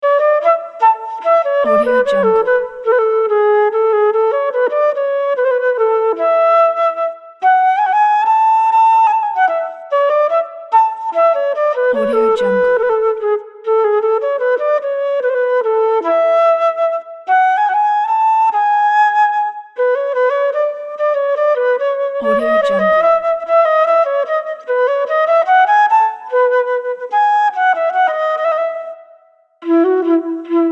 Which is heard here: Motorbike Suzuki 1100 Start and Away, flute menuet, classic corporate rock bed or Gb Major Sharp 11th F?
flute menuet